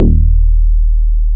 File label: GLORY D#1, BASSES; Best Service Alpha Dance vol.2